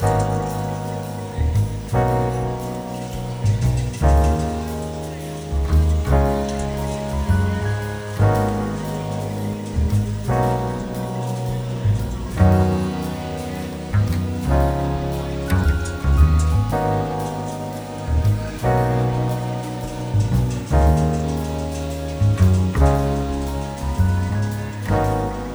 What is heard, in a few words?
ambience General; netent